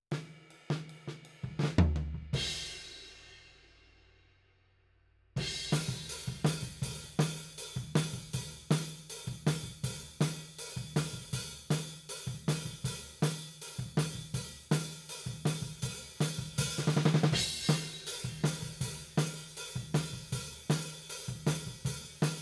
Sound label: Tom, 160 BPM